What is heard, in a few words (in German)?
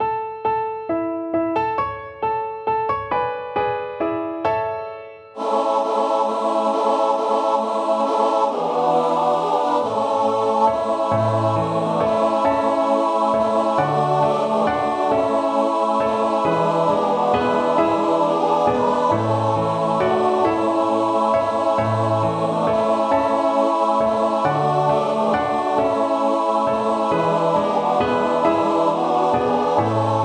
gemischter Chor vierstimmig